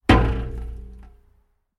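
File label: barrel sounds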